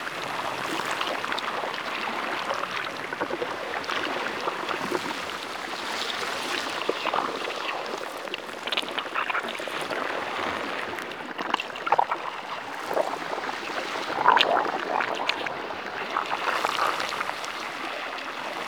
Molten Metal Loop; Props; Warframe; Tau; Ambience

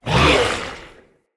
Attack, Vocals; Characters; Enemy; Corrupted Sproutfolk

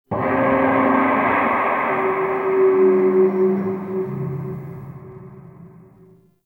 Stadium Metal Creaks Far; Warframe; Ambience; Nineteen Ninety Nine

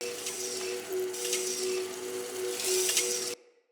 Casting Device Pickup Loop, Weapons Tech; Enemy

